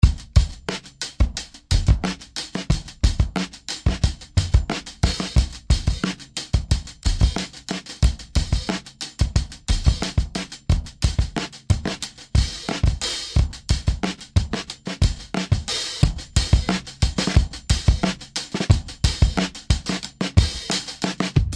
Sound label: Tasty Beats